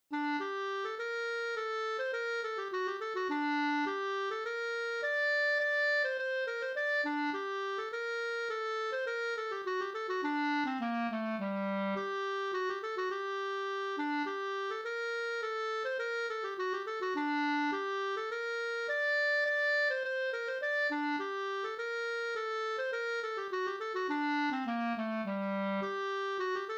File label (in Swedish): polska Storpolska; Storpolska från Östjämtland